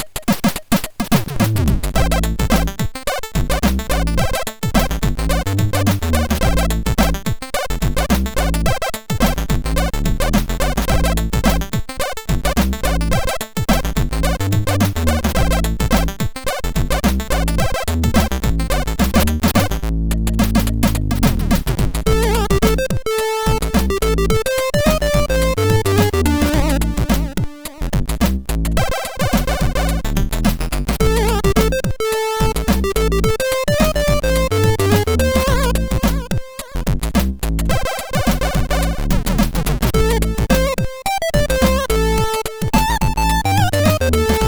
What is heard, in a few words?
Groove, sid MOS6581R4